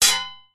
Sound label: sword strike metal could be shield; zapsplat